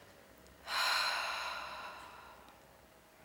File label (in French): SOUPIR; Index des soupirs; Hadjithomas Joreige